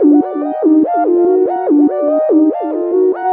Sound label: arp D 5; SpecOps2MPlayerDemo; Music